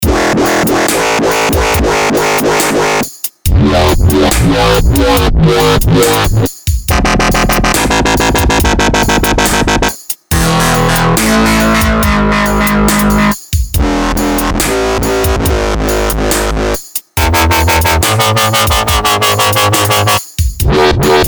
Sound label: dubstep massive